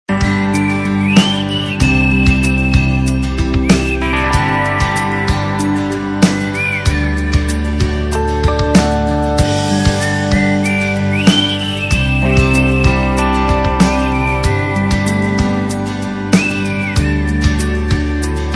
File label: Whistle Ringtone; Pal Whistle Ringtone from PAL - Monsoon Shootout Movie